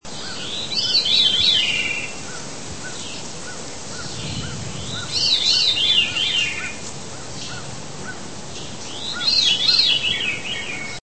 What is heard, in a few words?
veery song; central states north trip june 1977; usa